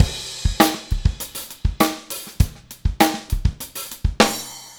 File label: Funkified, Rock Drums